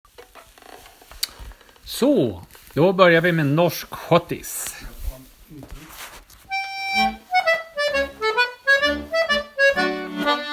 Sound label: Norsk schottis